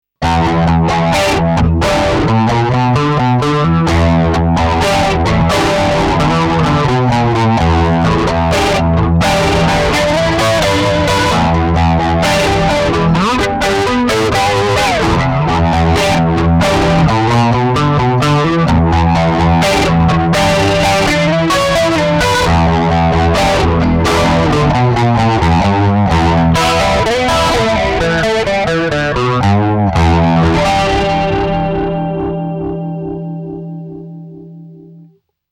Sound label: Maxon CS9 Pro Vintage Vibe Effect